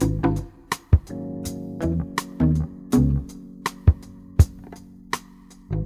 bass, Loops, Phonky

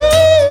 woo; ooh ahh; ho hoo woo

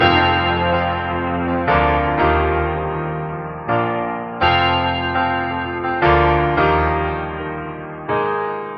piano, loops, phonky

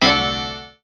blamer, Guitar; Notes

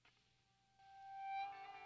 feedback

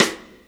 Rimshot, Snares

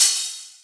Hats Real Open

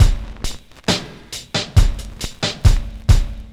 Drum loops, Boombap

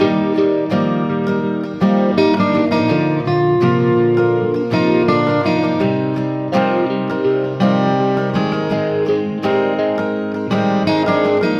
guitar

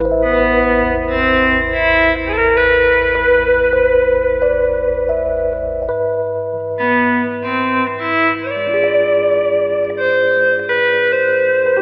sax, sad

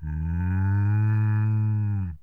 cow; Animals

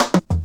fillz, Drum loops, Rolls

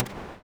chush, Percussion, Swish

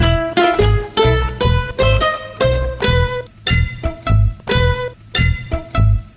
keys indian; loops

